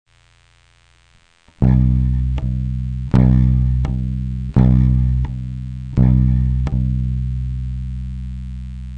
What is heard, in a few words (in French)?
accent, bass; TRAVAIL DES ACCENTS; main droite